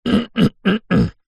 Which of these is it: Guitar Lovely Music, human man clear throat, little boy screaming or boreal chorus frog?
human man clear throat